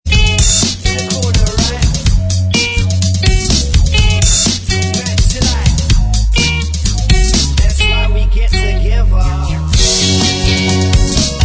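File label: backing vocals, backing track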